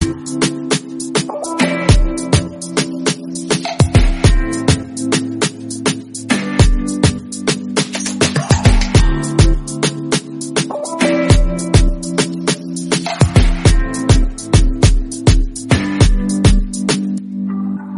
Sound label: instrumental